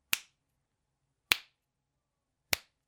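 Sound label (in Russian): щелчок пальцами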